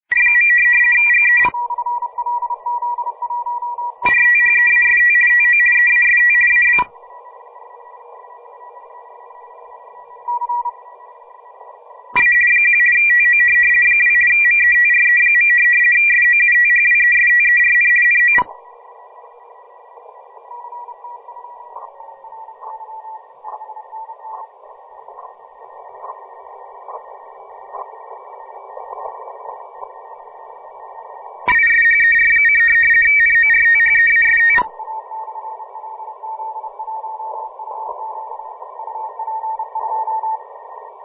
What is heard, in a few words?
RTTY